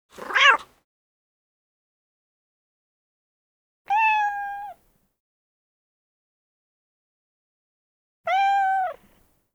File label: Animals Birds; Sound Ideas 6003